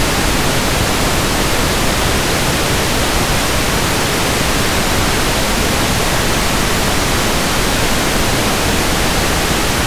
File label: passive buffered pink noise; syntherjack